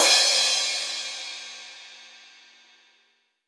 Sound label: Crash, Cymbals; Vengeance Samples; VEH3 Cymbals